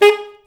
SAX Alto STAB, Alto Section FX

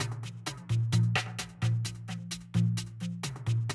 130, Classic DrumLoops

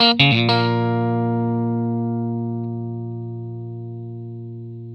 blues guitar riff 120, Vintage Blues Guitar

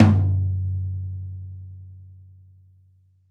Folk Tom

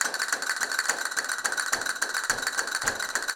Castanets, Flamenco

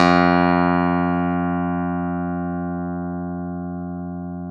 CLV, Clav; Roland L-CDX-02

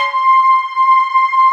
FILM SCORE; Greytsounds; Scott Peers Sound Engineering

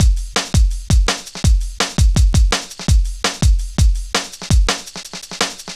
Drum Loops, Jungle, 166.5

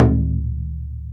Taiko, drum machines

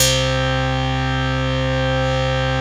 basses; Wizoo - Powered Wave

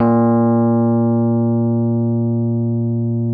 RHODES B1; Sonic Reality - Vintage Timetraveler

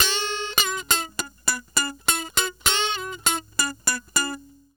Electric Guitar

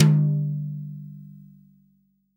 TOM, Real Drum Kit, SWING KIT

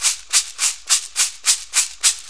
Shaker, Perc Loops; Drum Loops; 4-4; Sonic Foundry; Drum Tools

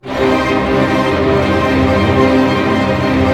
STR ARP MI, Symphony Orchestra, STR Arpeggio; Roland LCDP08 Symphony Orchestra; STR Arpeggios

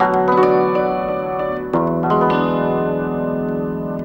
ZIMB ARPEGGI; Best Service; World Colours

